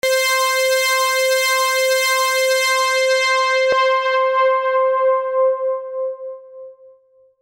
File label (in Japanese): ローパス 徐々 閉め